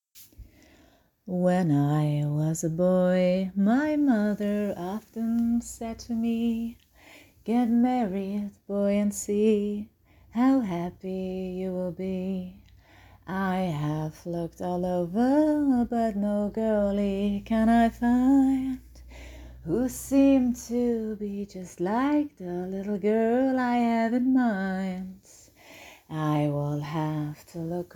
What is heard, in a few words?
Acapella Cover; Outlast